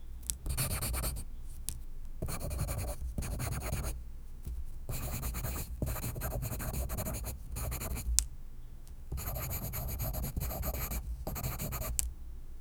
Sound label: pencil scribble on cerami